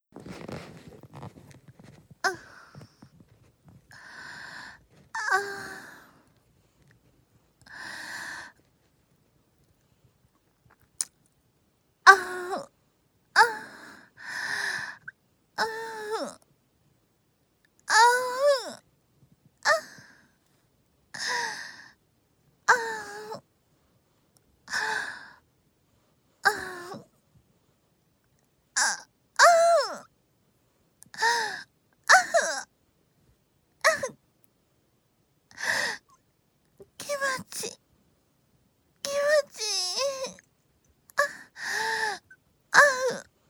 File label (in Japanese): 27歳 喘ぎ声と逝き声